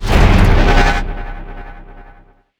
quad

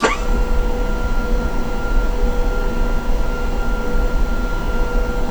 L-39 Fuel Pump; DCS

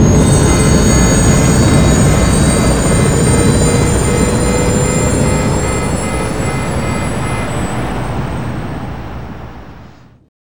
armada engine down

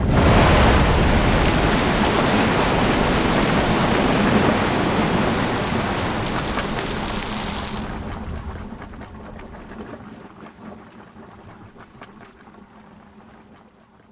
Geyser Eruption; Civ5

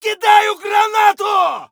grenade; Escape from Tarkov

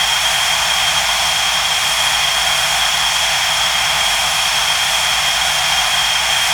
Aircrafts Engines IL76 Front Engine; DCS

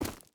footstep rubber boots gravel run; Rust

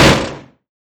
Vulcan End; Weapons; DCS

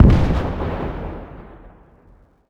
frag grenade explode far

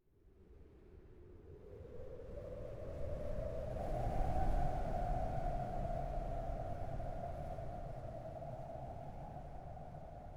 wind gust; Rust

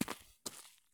soil turn; Escape from Tarkov

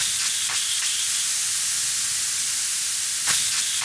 Amb Constructions; Red Orchestra Ostfront 41-45

